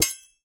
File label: sword clash cross